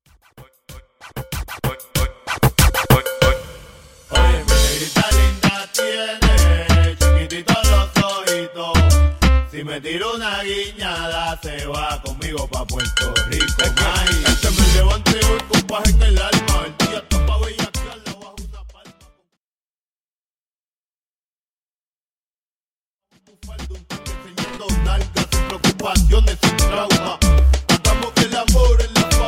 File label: Intro Outro 95 BPM